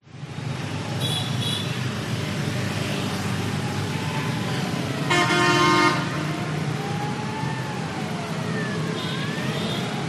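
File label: urban city busy road passing cars trucks motorbikes siren horn honks occasional people; vietnam mekong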